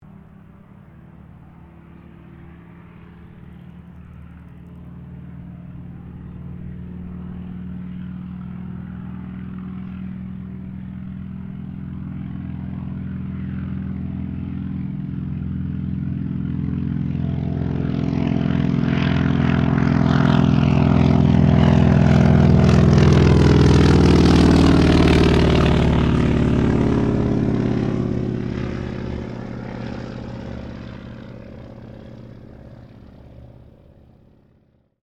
AIRCRAFT CAC WIRRAWAY TAKEOFF; CA-16 Wirraway; Airplanes; Vehicles; Aircraft Collection; Twistedtracks

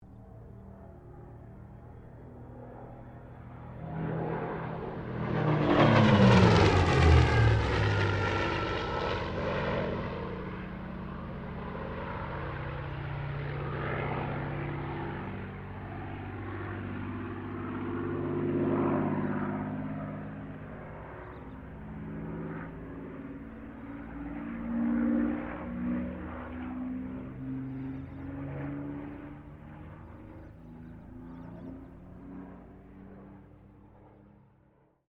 AIRCRAFT SUPERMARINE SPITFIRE MK-XVI PASS

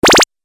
8 Bit Style Zapper; Video Game Sound Effects

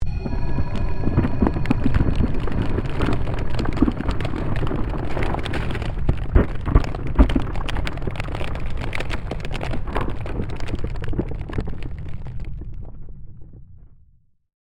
Created Magic Spell Glacier Quake

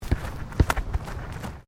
SOCCER BALL DRIBBLING; Soccer ball sounds; Sports

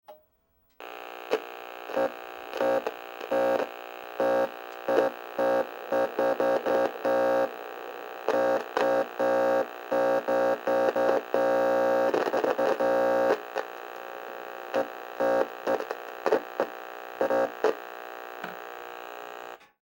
Television Broken On Run Off Buzz; Distortion; Technology; Blastwave

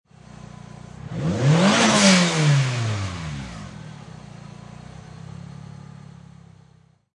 Mitsubishi Eclipse Engine Single Rev; Auto, Power Car, High Performance Car Sound: Mitsubishi Eclipse; Engine perspective; Revving, single motor rev; Lynne Music

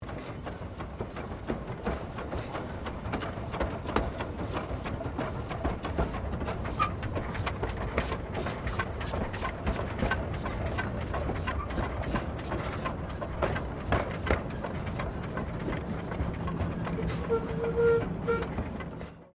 Steamtrain Moving Slow; Bellarine Tourist railway vehicles; Trains

